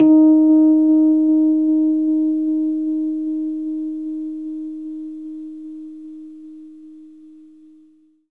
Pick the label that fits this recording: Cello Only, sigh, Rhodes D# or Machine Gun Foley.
Rhodes D#